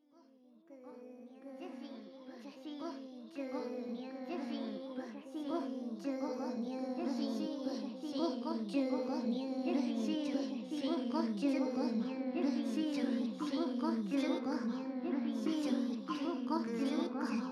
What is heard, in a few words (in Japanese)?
セリフなし; 魔法少女マスカリマッスル; エターナル魔法しこしこトレーニング めにゅー１